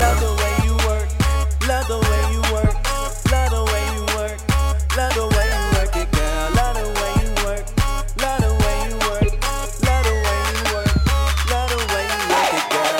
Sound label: Bring it back up Loop